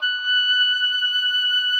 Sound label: OBOE F#6; Xsample VOL-6